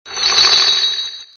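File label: bell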